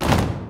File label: stomp; sound loops